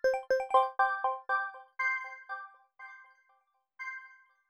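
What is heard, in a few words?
granular bell accent C major; accents; bespoke - Floral v.2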